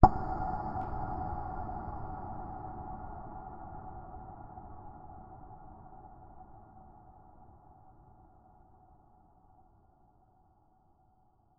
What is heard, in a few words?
sound design hit cinematic shock realisation reverb